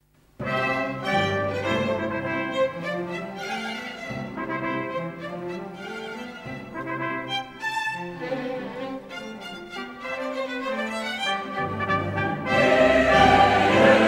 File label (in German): SPATZEN MESSE in Do magg.; Religion Classic Vol.3